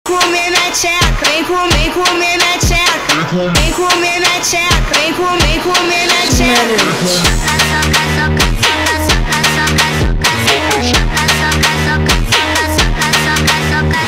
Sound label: brazilian phonk remix